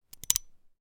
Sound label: gun 1911; weapon; still north media